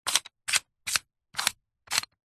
leisure game plastic panel squares slide solving puzzle; rubiks race